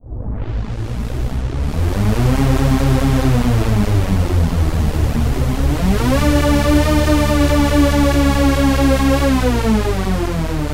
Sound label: Synth